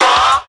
ah group of men; Voice; ooh ahh

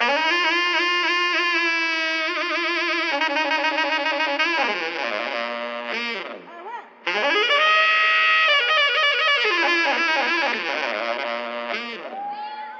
sax twiddling, Loops; Phonky

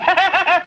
laugh; peewee

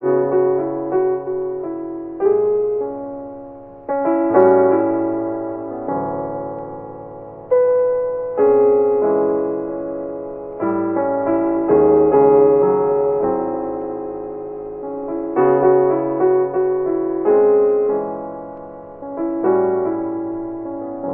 piano sad jazz, Loops

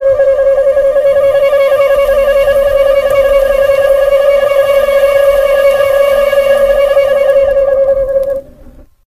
"ooh" long warble

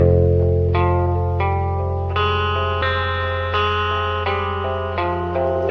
guitar sinister urges; Loops; Sad

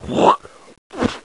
spit

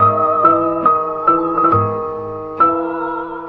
piano with opera, sad loop; Loops

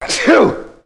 achoo

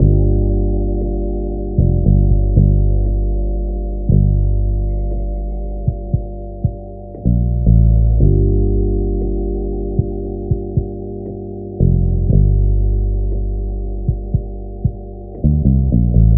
bass defunked, Loops

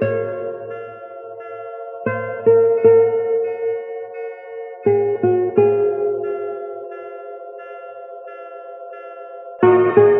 guitar, Sad

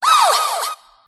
ow

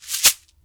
Shaker

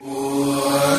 "what" SUNG; ooh ahh

